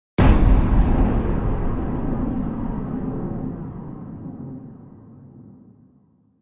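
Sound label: Impact Metallic Clang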